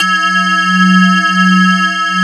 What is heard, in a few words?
METAL BAZZ